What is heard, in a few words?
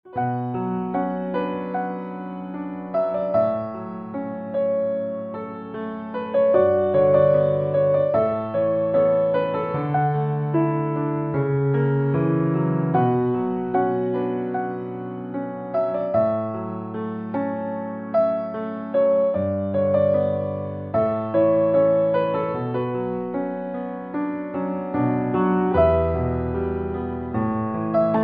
theme piano; Dongzhou